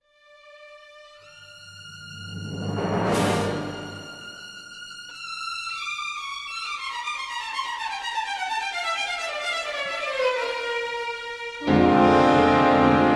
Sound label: TANGO, Tango Symphony